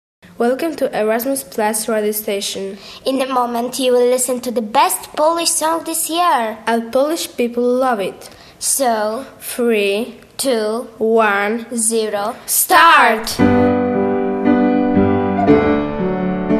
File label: polish pop song